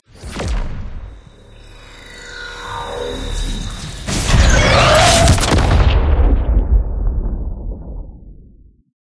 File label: Laser Activate; Geode Tear